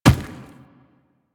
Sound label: Infested Medium Run Dirt, footsteps; Warframe